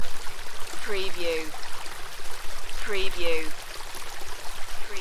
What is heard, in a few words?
water creek loop